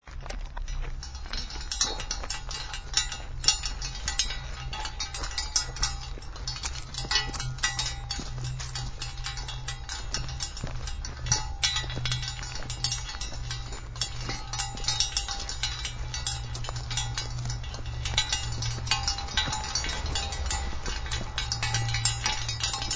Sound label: bellwalking